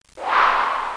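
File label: wind; ziranjie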